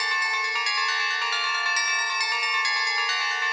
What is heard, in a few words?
Tube Bells 136-A, horrorscape samples 136bpm